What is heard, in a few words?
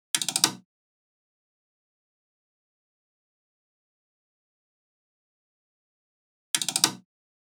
perc loop 75, Pop, Drums; Loops; Slate; SD PASADENA Pop Sample Pack